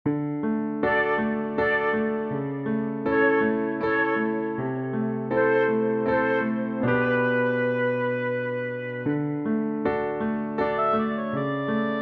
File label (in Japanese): 懐かしき村, BGM